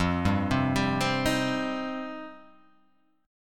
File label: F 7th Suspended 2nd F down